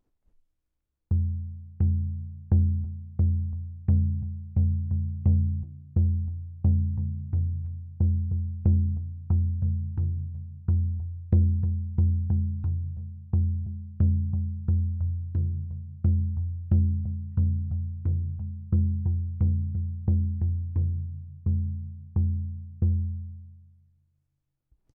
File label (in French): le hibou, tambour chamanique en peau de bison